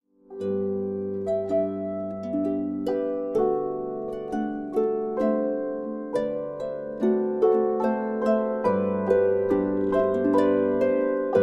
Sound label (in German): Bauernmenuett; ADVENT